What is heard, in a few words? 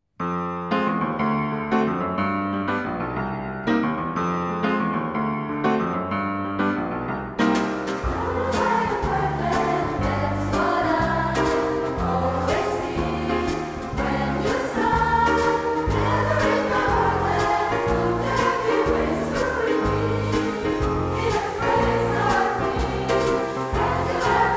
Lullaby